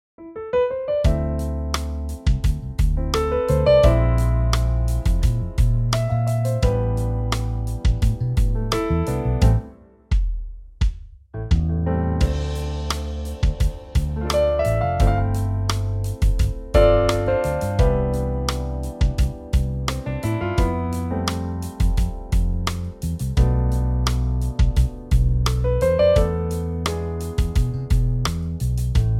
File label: Smooth; key - Am - vocal range - E to G